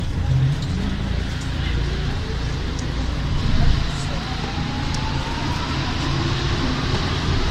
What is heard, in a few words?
start, metro